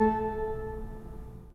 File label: Pipe Mix Celeste, 3D Organs